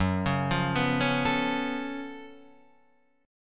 Gb Minor Major 11th Gb down Guitar Standard; GbmM11 arpeggiated; JGuitar

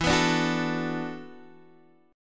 E 9th Suspended 4th E down Guitar Open D

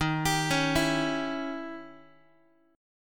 Eb 7th Flat 9th, Eb, Guitar; x,11,10,11,8,x; Eb7b9 arpeggiated; JGuitar

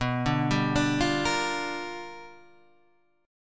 G Minor 7th, B; Gm7/B arpeggiated; JGuitar

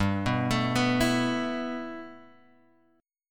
G 6th Add 9th, Guitar, Open G

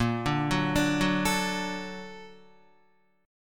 A sharp Augmented Major 7th A sharp Guitar; A#+M7 arpeggiated; JGuitar

